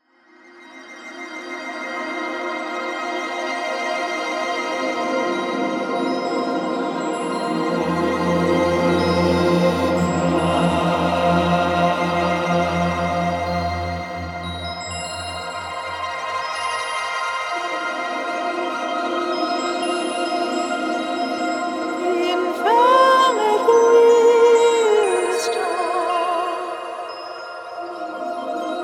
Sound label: Chakra Healing Chants